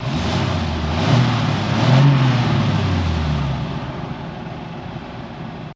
Cadillac, 3 Medium Revs In Succession

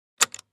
1930 Radio Button; switches

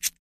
Bic Lighter Strike; lighters & matches

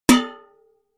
Impact Metal Bucket; barrels buckets & cans

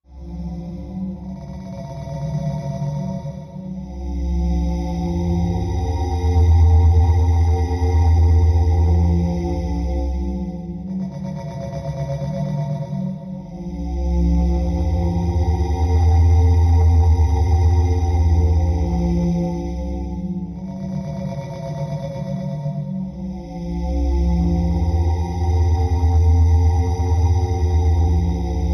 Ozone Pulse Med Low Air, eerie ambience

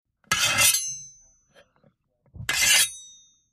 Spatula Long Scrape, kitchens

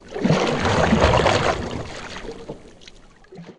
Canoe Paddle Stroke Underwater

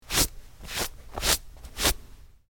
Brushing Off Clothes

